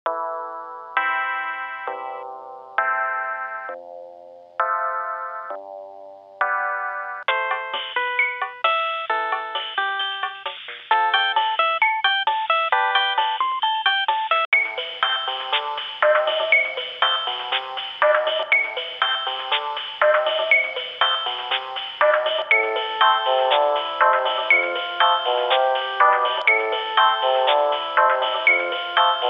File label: ringtone medley; Nextel ringtone medley